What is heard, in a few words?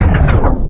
Bend; gp500